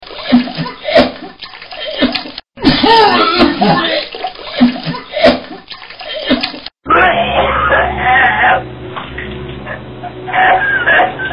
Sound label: Vomiting Human